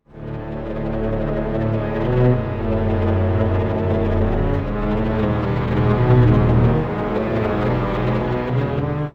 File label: 2, strings, pitchshift; prosoniq time factory clear scale